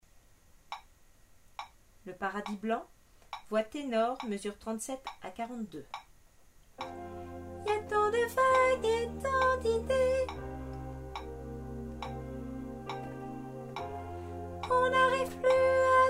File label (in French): tenor